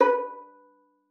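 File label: violin pizzicato B5; Xsample VOL-5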